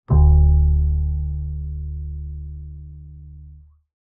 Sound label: Bass, Jazz for piano